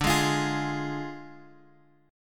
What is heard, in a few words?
D Diminished D; Ddim Chord {X 5 6 X 6 4} strummed